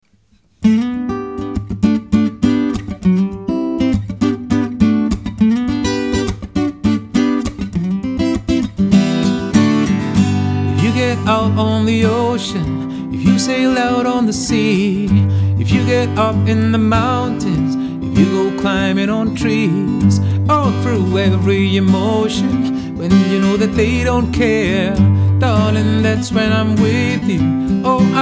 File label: solo unplugged